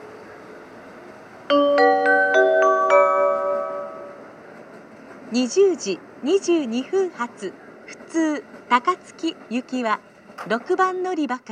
amagasaki local takatsuki; station; jr-west

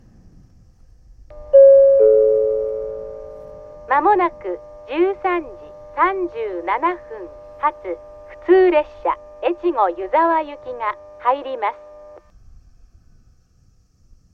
up local; joetsu-international-skiing-ground; echigo-yuzawa; jr-east

